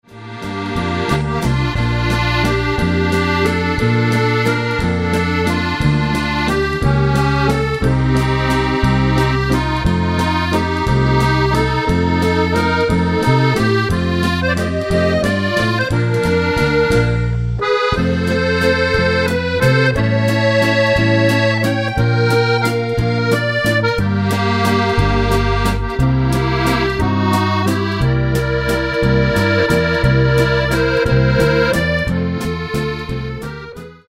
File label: European Waltz